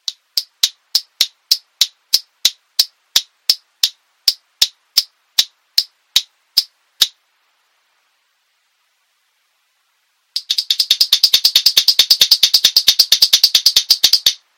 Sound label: clicking prank